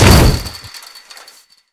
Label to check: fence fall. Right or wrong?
Right